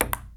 PRC PP, Ping Pong; Rhythm Section; FX Perc

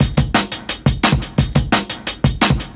DNB BREAK

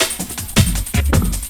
LOOP SD, Drum & Bass, 160 BPM

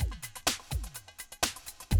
125, Classic Drum Loops

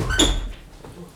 Prepared Piano; Battery 3 Sample Kits

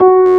ORG B3, B-3 Organs; Sound & Vision; Gigapack I CD 2

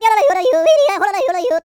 YODEL; ILIO; Vocal Planet

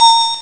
bell; ST-XX Amiga Soundtracker Sample Packs

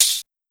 RNB CRS, Metallic Percussion; SN-R8-11 Metallic Percussion

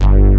FAT SYN BS, BASSES; Pro-Rec - Synthsonic

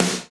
SD, KIT Real-Kit; 90 s Sample CDs; Sound & Vision - Gigapack I CD 1 (Roland); Real-Kit m 2

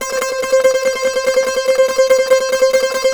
SAZ; Spectrasonics; Hans Zimmer Guitars VOL-2

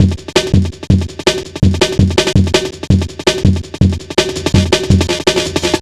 Drum Bass, Drumloops, 165bpm

